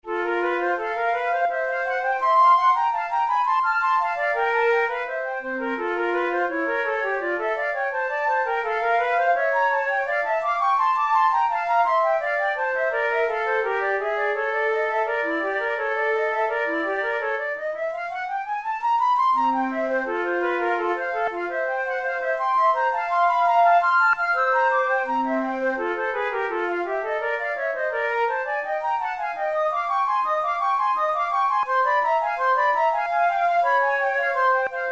flute

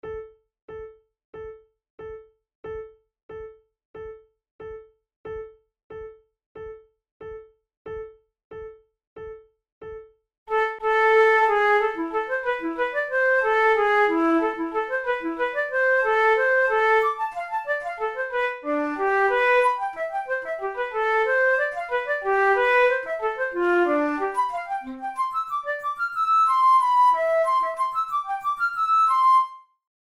in a minor, flute

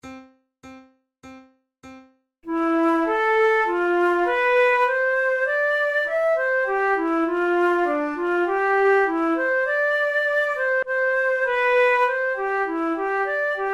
duets, flute; forty progressive duets

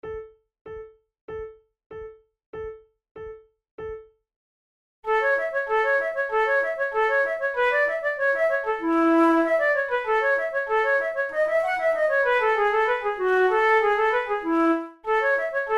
pour la flute duos allegretto

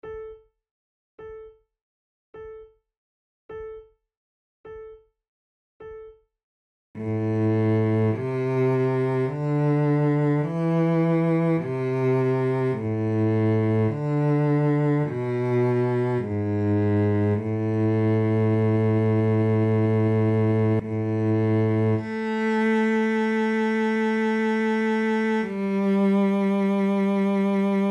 recorder sonata in a minor largo; marcello op2 no10 iii

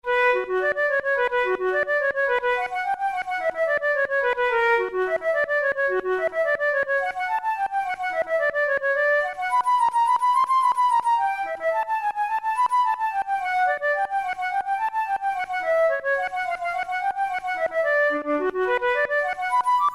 studies for the boehm flute; drouet 72

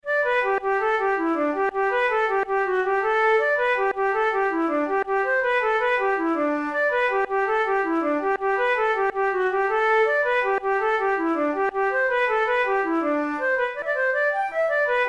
jig

